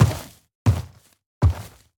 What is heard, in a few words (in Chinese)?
脚步声; 嗅探兽